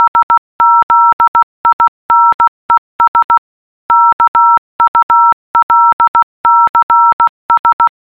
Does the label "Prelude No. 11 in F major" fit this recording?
No